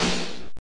Hit; Bioworm; SNES

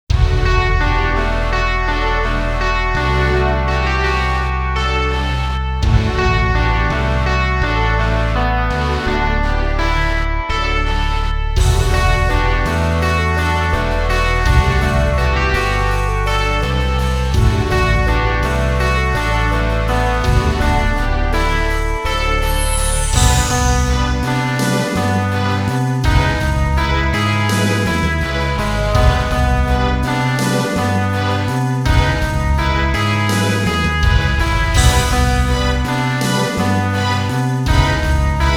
Lose; Initial D Arcade Stage; sega

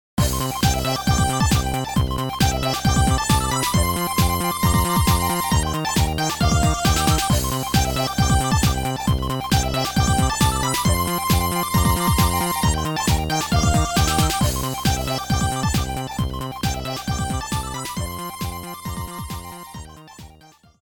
Draw; bomberman ds

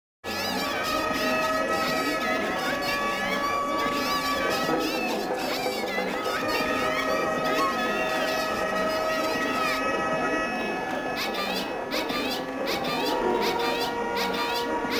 Stage Ambient Music; The Last Blade; Akari Ichijo

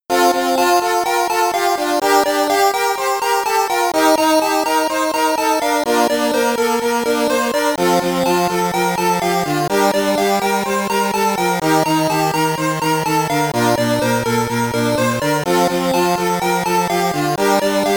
Victory!; fire and ice amiga

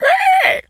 pig scream short; Infernal Radiation

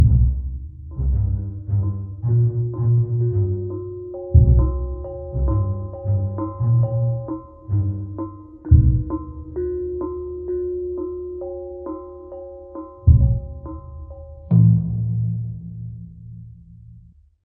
Jungle Level

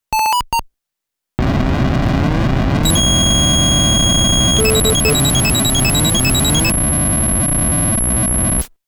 STAGE CLEAR (BONUS)

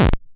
Sound HURT; Diamond Hollow 2

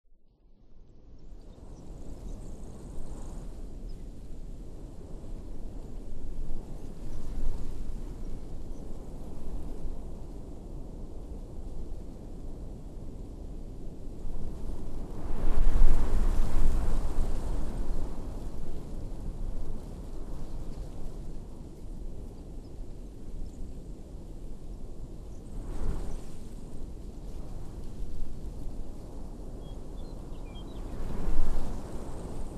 Ambient Wind In Forest

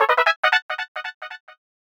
Learned PSI!

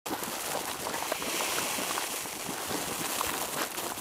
Acid Ground

